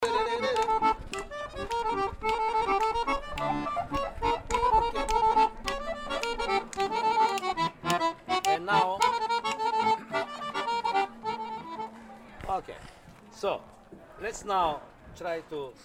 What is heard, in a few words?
singing class